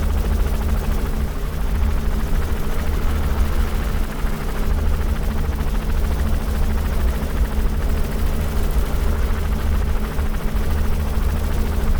Heliloop Exterior

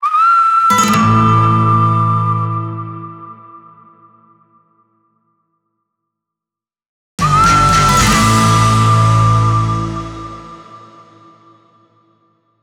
jingles; Nuclear Throne; Wasteland Scraps 101 BONUS

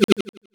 Menu Background Scroll; Uno

